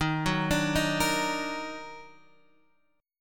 D sharp Minor Major 7th, chord; arpeggiated

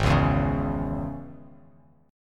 Ab Suspended 4th Sharp 5th chord, Bass Standard; 4,4,2,6; strummed; JGuitar

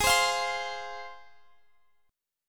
C Suspended 4th Sharp 5th Ab

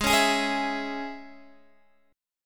Ab Suspended 4th Sharp 5th Guitar Open D

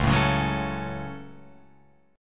Db Suspended 4th Sharp 5th Guitar Open F; strummed

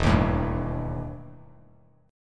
E Minor Major 7th Double Flat 5th E Bass Standard; strummed; JGuitar

